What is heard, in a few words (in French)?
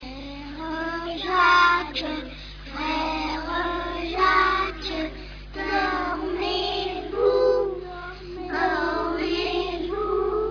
chant